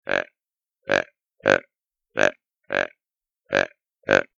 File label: Buck Grunt Tending, Deer; ORION